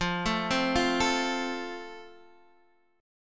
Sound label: F Major, F Chord; arpeggiated